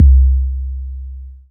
Single Electric Basses, Dance Floor Bass

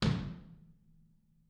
R B Kick Room, drums, One Shots; Sonic Foundry; Sony Creative Software; Drums from the Big Room The Mixes; R B Kicks

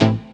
DR. LOOP; X-Static Goldmine 3; CHORDS

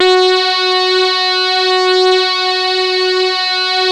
SAW RAVE, LEADS

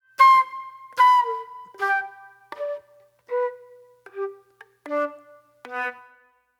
Soul Plate Flute

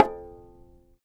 TAR; Roland - LA Composer vol. 3

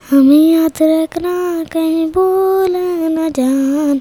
CHILD, Indian Traditions; USB Soundscan vol.45; AKAI

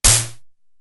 Tom, Drumfire DF-500

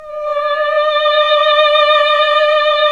Nuns Mmmhh; Sound & Vision - Gigapack II

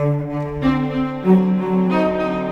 Cinematic Strings, Orchestral; Cinematic 07; Orchestral Series

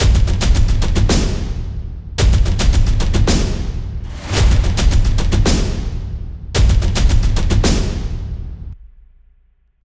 War drums 220 bpm

